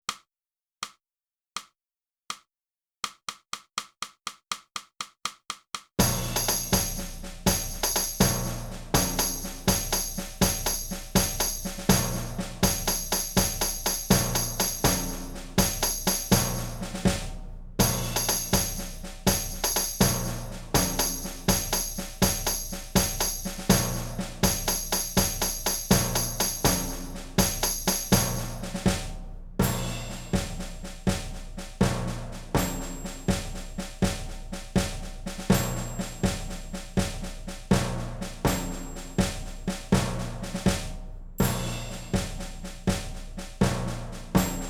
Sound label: sax feeling the rhythm track